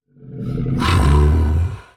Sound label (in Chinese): 待机声; 劫掠兽